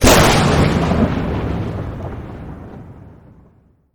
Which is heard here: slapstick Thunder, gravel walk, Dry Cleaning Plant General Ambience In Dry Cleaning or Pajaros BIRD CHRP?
slapstick Thunder